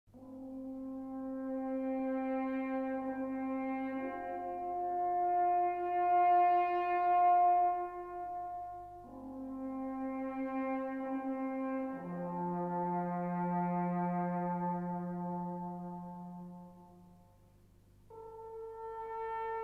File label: Alphorn Melody; Media Music Ltd; Short Cuts From Around The World